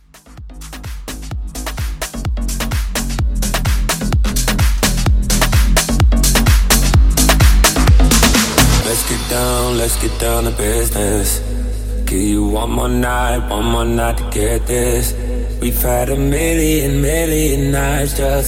Afrobeat Re-Drum 128

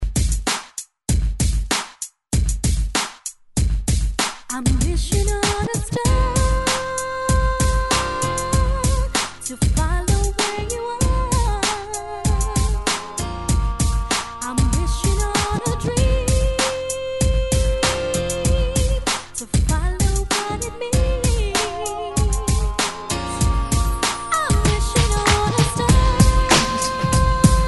ReDrum 98 Bpm; remixplanet

